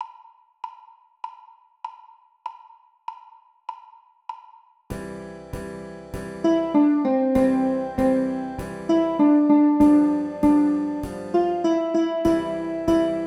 Fight Song

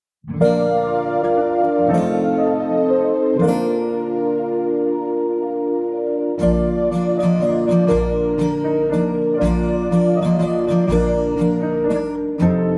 Christmas Carol Silent Night Backing Track

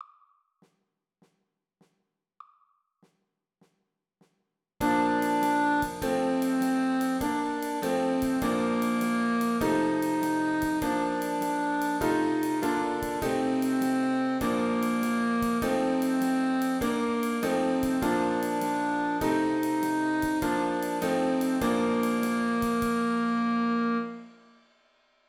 Ladders Winds

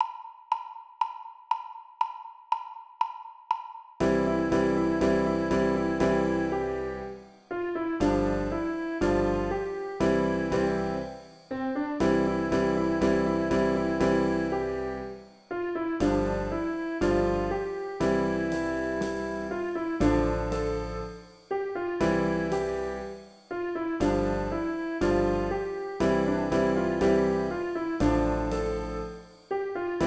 Italian Song

